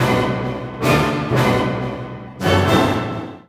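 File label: Orchestra Hits; Smooth Ride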